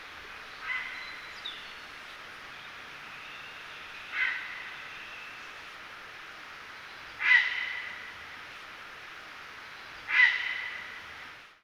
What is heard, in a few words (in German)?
graureiher